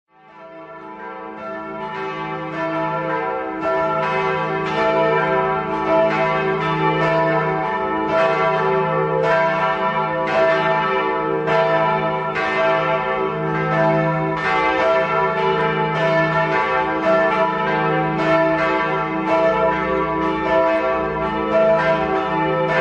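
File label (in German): Geläut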